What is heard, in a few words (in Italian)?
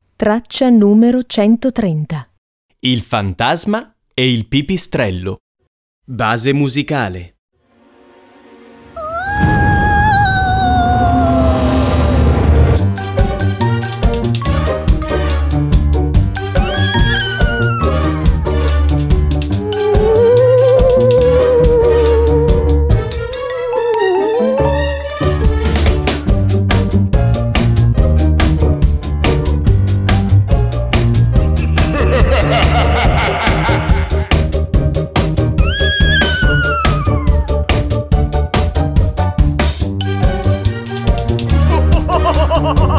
base musicale; scuola al centro; infanzia